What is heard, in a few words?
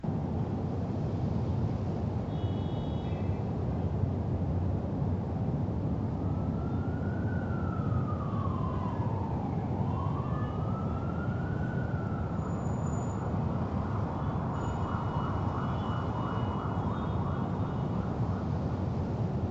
ambient